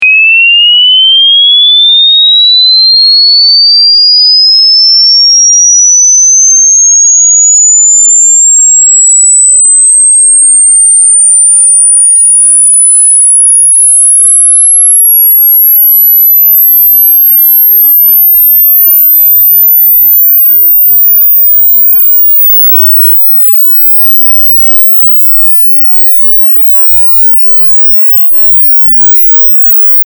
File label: Sine sweep 2500Hz to 20000Hz 0dB